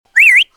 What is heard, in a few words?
monster click